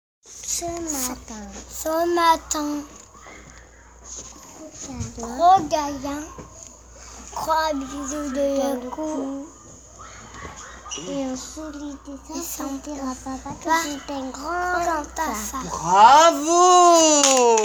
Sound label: Vocal